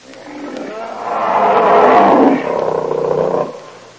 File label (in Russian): medvedyu chto to yavno ne ponravilos